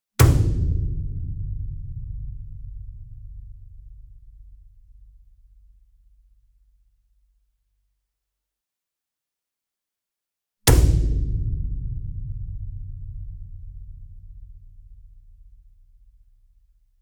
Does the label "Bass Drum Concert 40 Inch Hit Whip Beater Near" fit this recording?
Yes